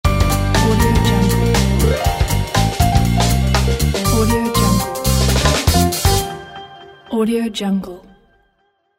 a bright flash logo

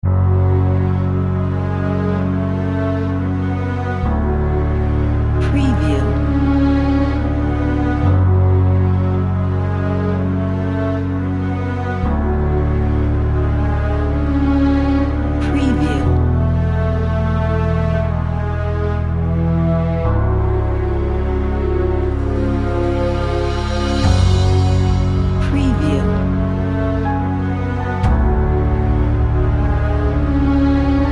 calm and cinematic

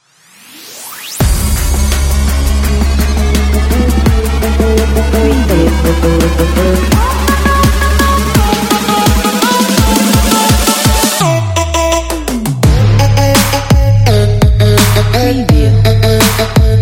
trap commercial background

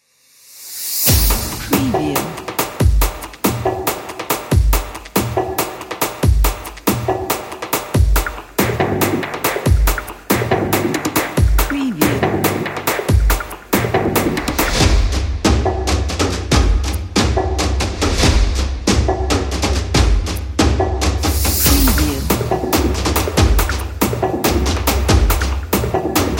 action industrial percussion

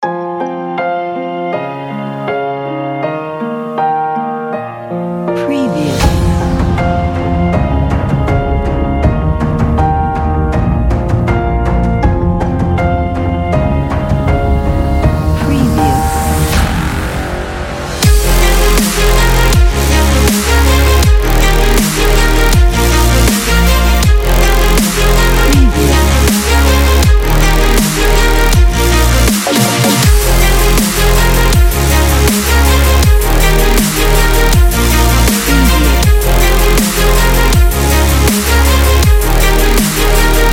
hybrid epic dubstep

